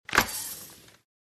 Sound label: click